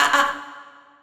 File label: AAHAA VOX, Funky Vocals; USB Soundscan vol.18